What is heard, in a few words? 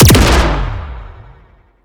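Sci weapon Shot Medium Gun Sharp Shooter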